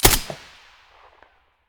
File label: m4a1 silencer stereo, weapons